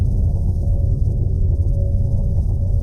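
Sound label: shield windup layer; apocalypse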